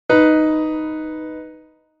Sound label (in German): Intervalle simultan